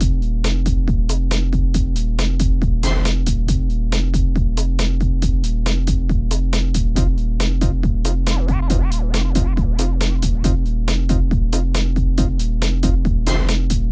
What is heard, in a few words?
Get Your Groove on, 138 Fmin, Hardcore Breakbeat; Big Fish Audio; Hardcore Breakbeat Action